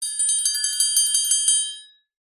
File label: musical triangle hit rattle